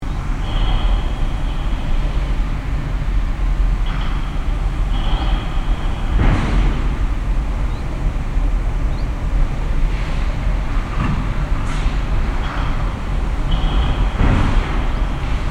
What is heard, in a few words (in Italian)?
Stampaggio